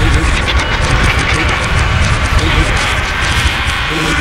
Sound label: fire, weapons